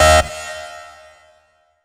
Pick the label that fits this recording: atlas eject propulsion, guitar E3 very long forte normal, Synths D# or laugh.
Synths D#